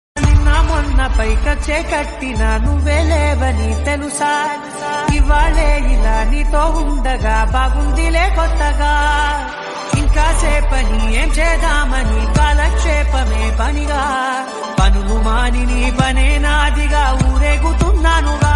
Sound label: song ringtone telugu bgm ringtones